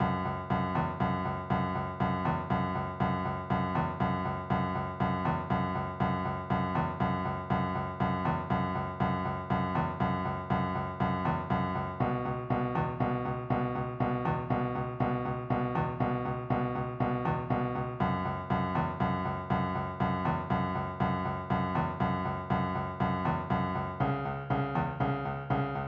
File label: blues